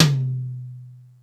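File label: acoustic mid tom, drums; East West; Global Sounds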